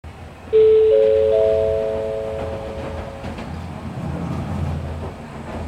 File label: chime; hankai